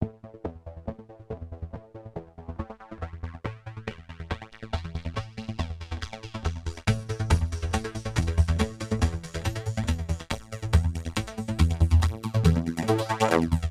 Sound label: TEKNO 140; Techno2